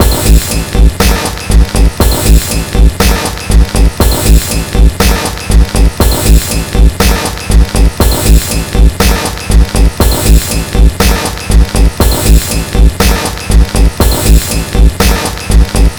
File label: hard victory